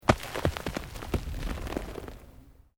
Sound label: ROCK TUMBLING